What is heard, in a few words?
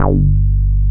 Synth Bass, Analog Bs